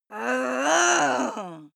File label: female aggressive snarl rise; schreie; menschen